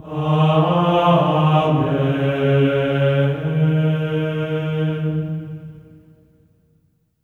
AMEN D#4, Symphony of Voices; Spectrasonics; GR AMEN

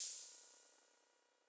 CYMBAL, Sound-Master SM-8

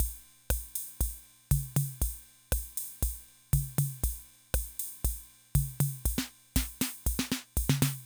Loop, rhythm 55, classic machines

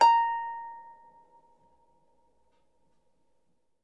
Harp A#5; Miroslav Vitous - Percussion & Harp

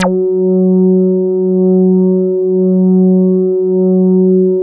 MINI JUZY F#3, MINIMOOG; Big Fish Audio - First Call Vintage Keyboards

